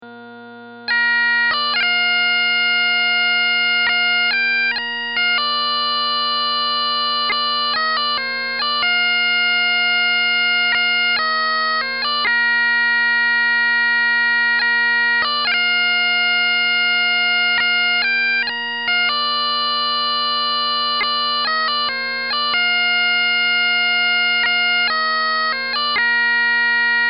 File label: Drumming